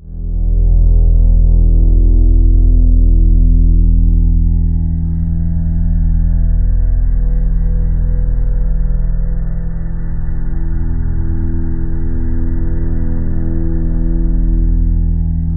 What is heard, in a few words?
faraway, low tones, ominous drones; USB Soundscan vol.48; AKAI